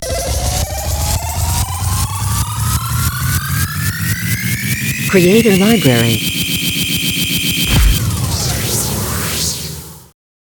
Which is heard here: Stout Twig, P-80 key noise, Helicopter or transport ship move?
Helicopter